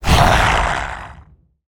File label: Swamp Wizard death; SwampOfDarkness